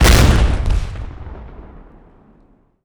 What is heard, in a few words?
explosion, grenades; Frag